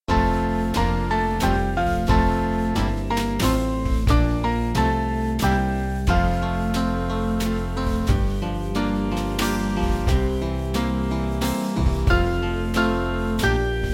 National Anthem Band

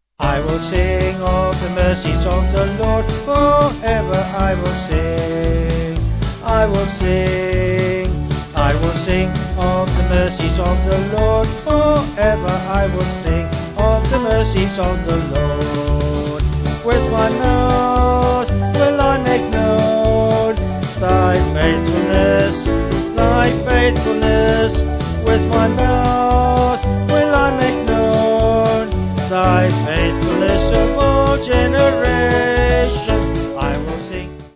Vocals Band

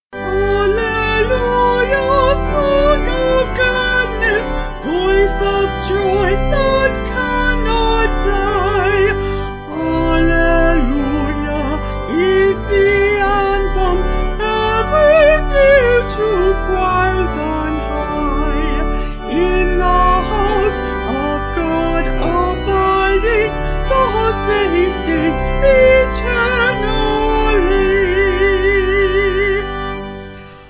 Vocal Organ; Vocals and Organ; Sung Lyrics

